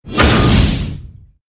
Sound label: chevron incoming